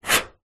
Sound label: foley metal scrape large loud short